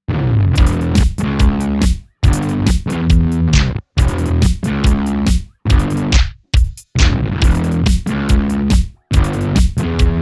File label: zoom distortion, bass; Zoom Ultra Fuzz into English Muff'n; Zoom gated fuzz into Muff'n real tube overdrive.; My other fuzz sounds horrible into the Muff'n, turns into ultracompressed, mega-bass